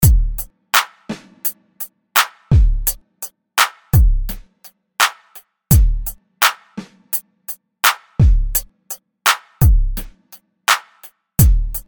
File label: rappin beat